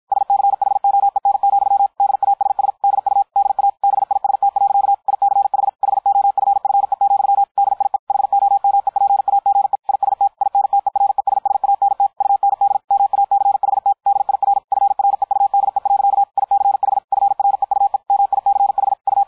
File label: Beispiel 250 BpM